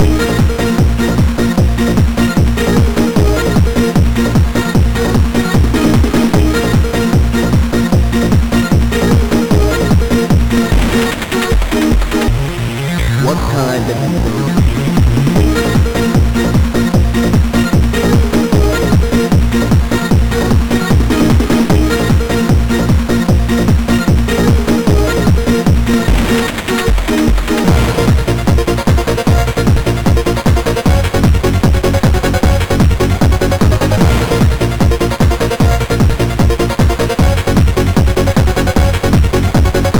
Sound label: Fire MOS6581R3